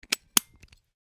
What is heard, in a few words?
Padlock close snap; locks keys